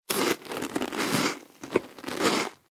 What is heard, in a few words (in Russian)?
hrust suharej